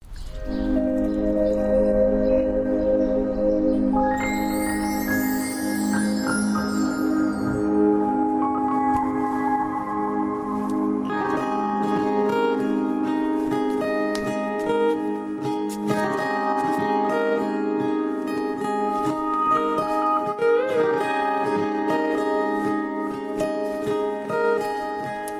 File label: Romantic Music Video